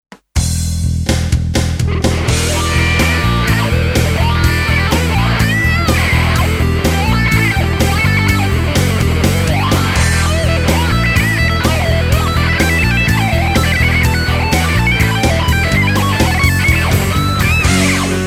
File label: solo tonelab st; gitar